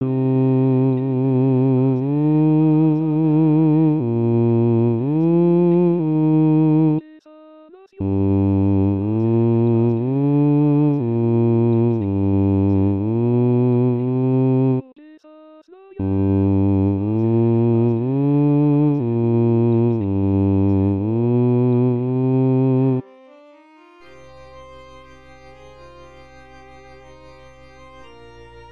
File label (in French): qu em soi lhevada basse